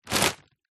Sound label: food frozen peas bag pick up; zapsplat